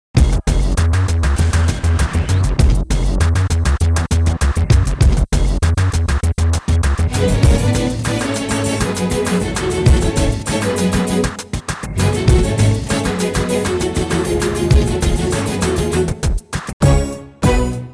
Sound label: Theme Music; Anbe Aruyire (2005)